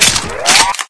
sniper bolt; unreal2

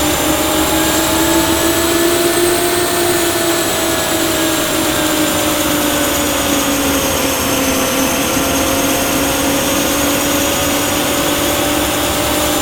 dropship fly; antares; svencoop addon

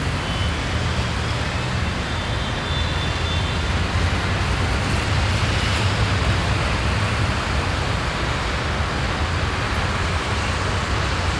city loop; crimecity

